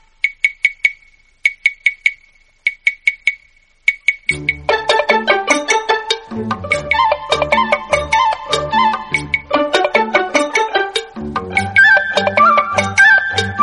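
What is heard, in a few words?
background music Telugu Ringtone; Ilayaraja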